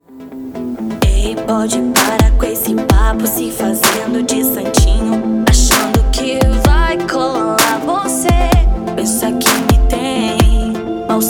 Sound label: FUNK